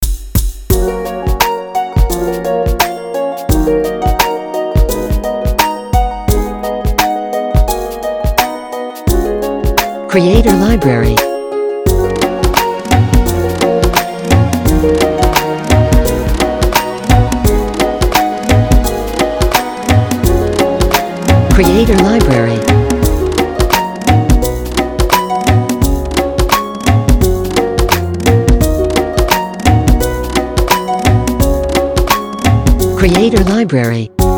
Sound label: Lovely Pop Music